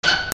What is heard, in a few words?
SQUEAKS